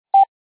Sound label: Short, morse